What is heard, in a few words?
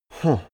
male sigh impressed; menschen; koerpergeraeusche